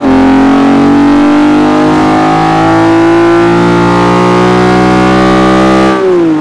third, vehicles m5e34

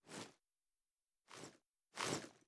plr ar reload empty xmag fast cloth, weapons; viper

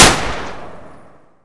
mac10, uzi; weapons; gmod

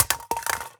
phys mag drop ak metal concrete, magazine drops

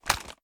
drop down; gmod

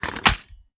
reload mag in, reloads; gmod

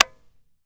disconnector; weapons; gmod; viper

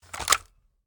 wpn gbl plr inspect settle; viper

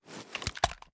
sm uzulu reload empty scope end; reloads; iw8; gmod

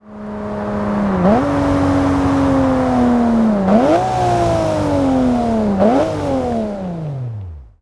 throttle off, vehicles; bmw1m

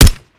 mike4 sup, weapons; gmod; viper

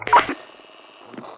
wheel popup, user interface; gmod; mw

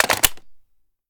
reload magin, weapons; gmod; viper

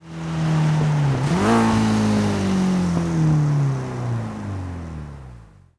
throttle off, vehicles; silvia; gmod; tdmcars

